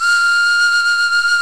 BOTTLES; OMI - Universe of Sounds

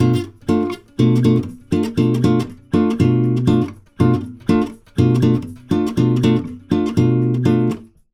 120 BDDIM, Brazil Bossa; USB Soundscan; AKAI

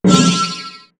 JUNGLE NIGHT; East West; Global Sounds

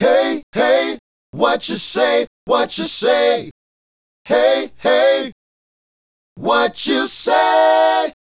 Male Group, Hip-Hop and RnB Vocals

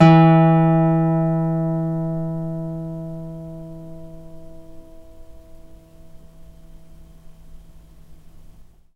NYLON GTR

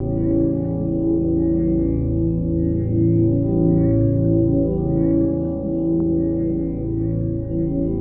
Guitar Textures

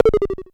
Klone Dual Percussion Synthesiser tom; KLONE FX NW8

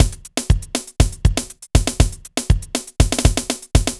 Synth Loop, BPM120

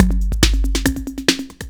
Tom Pattern, drums

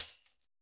shakker; Roland MC-909

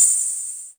Cymbals Ride; Vengeance - Essential Clubsounds Vol.2

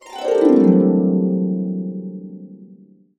C, Harps; USB Soundscan vol.62 - Complete Harps; AKAI

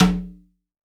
CONGA, STEREO CONGA

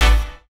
chords

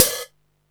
HAT, Techno 138 BPM

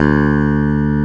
E BASS P D1, Pick Bass

